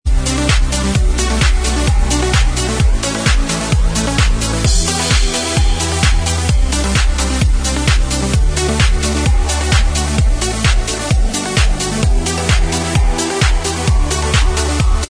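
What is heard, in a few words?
remix instrumental track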